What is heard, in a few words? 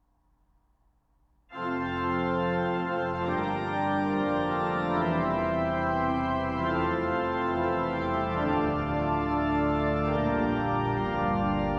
Fanfare; frogner oslo